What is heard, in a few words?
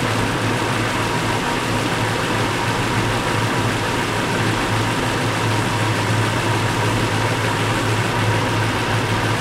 stug40 engine rpm 00, tanks; engines; War Thunder